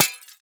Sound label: glass hit; Teardown; PS5, Windows, Xbox Series X/S